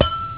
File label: CLANG, GLASS